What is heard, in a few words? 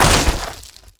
gravel land